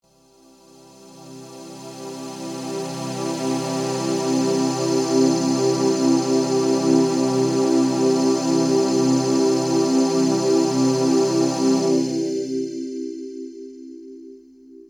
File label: pad